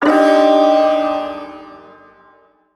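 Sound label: Shawzin Minor Chord; Min Chord E; Warframe; Duviri Ersatz Shawzin; Chords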